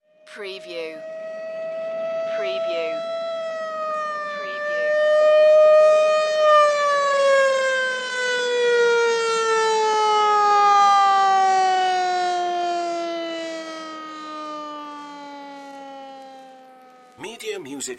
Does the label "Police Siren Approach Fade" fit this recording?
Yes